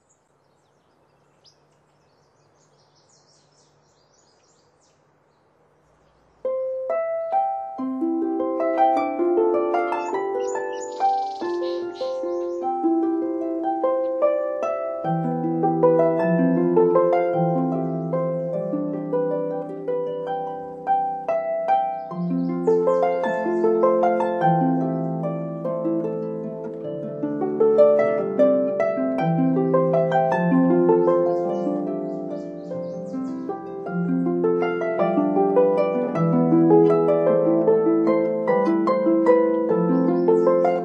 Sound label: Harp